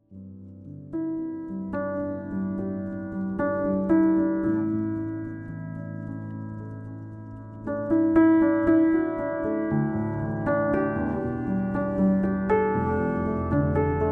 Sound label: piano diary